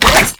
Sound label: Spin Whoosh, Weapons; Infested; Coda Caustacyst